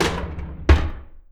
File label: DRAWER, household; AKAI Anime Sound FX